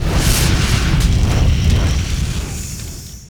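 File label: drake fire attack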